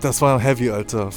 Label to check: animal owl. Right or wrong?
Wrong